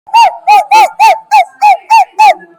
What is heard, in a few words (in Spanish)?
perro fumado drogado